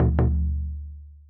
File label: taiko